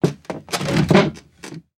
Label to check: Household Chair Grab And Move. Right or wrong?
Right